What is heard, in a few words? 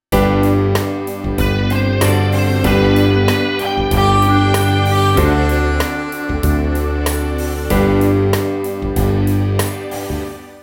ballad